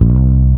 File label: Single Acoustic Basses